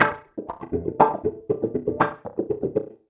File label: 120 WAH WAH, Electric Guitar Loops; USB Soundscan vol.04 - Electric & Acoustic Guitar Loops; AKAI